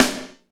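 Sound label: SNR R B, snare, R&B Groove; Northstar; Drumscapes Roland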